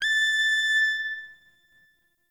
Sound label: Hip Hop Pack